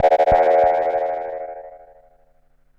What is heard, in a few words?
Perc Synth Moog Delay; sci-fi